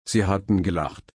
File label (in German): lachen